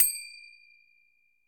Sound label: Finger Cymbal; Latin Percussion; Drums; Drum Hits; Ableton; Factory Packs